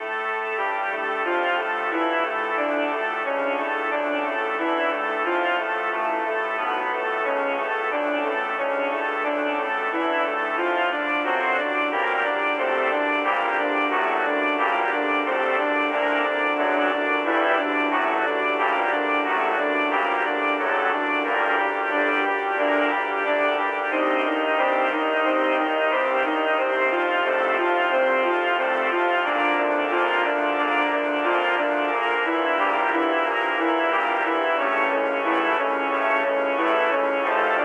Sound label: Lounge